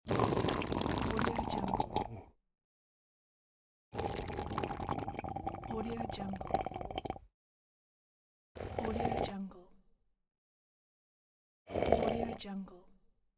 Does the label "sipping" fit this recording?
Yes